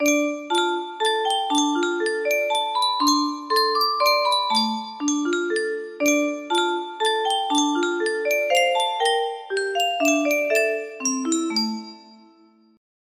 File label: sankyo tune music box, music box melody; world of warcraft